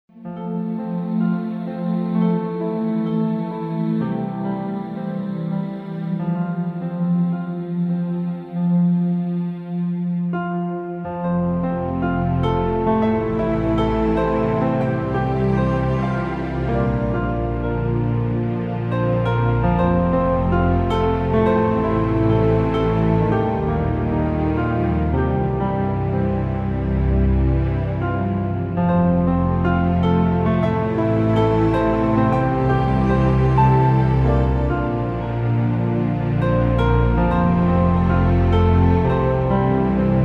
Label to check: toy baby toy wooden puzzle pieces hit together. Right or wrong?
Wrong